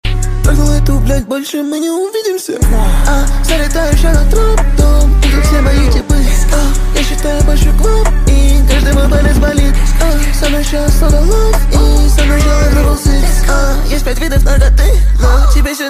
trap

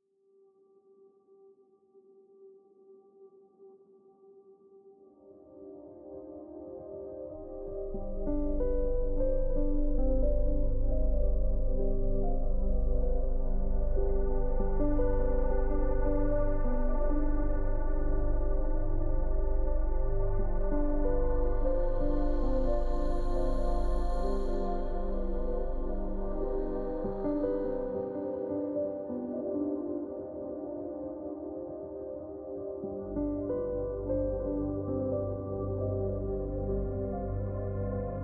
musica latina

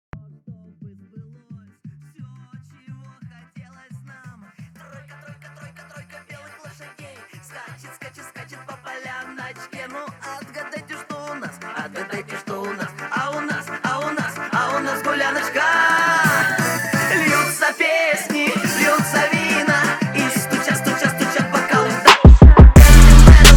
trap remix

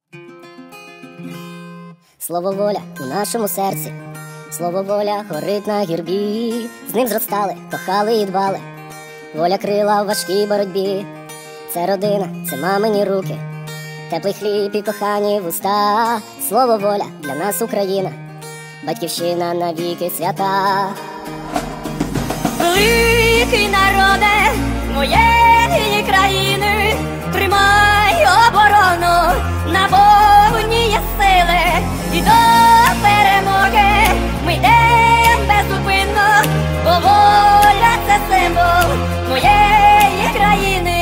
speed up

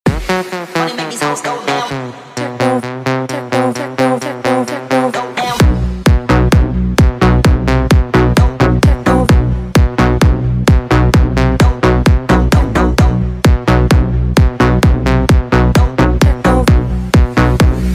techno

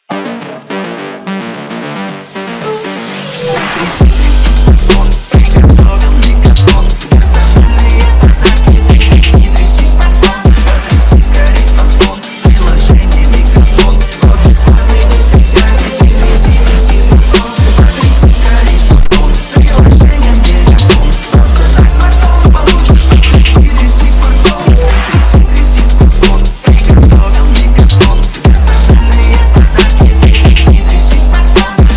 phonk edition

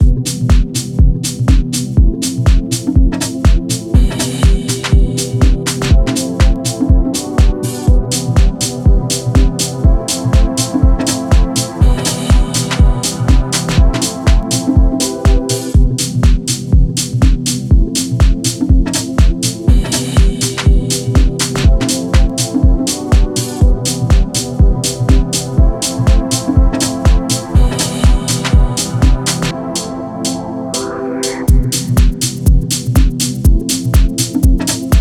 deep house

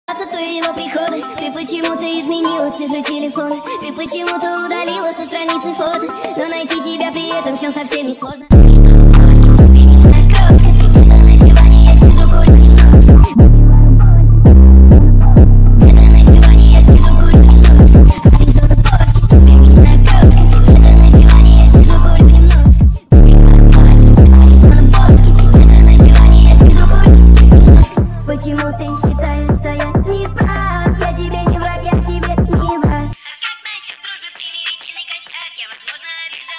tik tok remix bass

